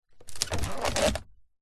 household basket picnic hamper lid open creak

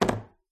phone drops on wooden table; your free sounds

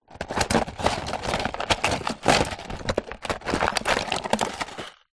industrial rummage in tool box small plastic

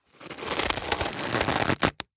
Creaks n Squeaks Cornstarch Creak; soundbits

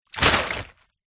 plastic bag empty throw into deep water; foley

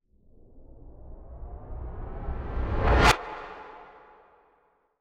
Swell Metallic; bottlerocket

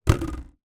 toy police helmet plastic uk style down on table

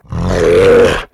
Screams Shouts monster processed; soundbits

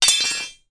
impact metal rod drop solid ground

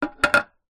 tin small metal empty down on wood surface

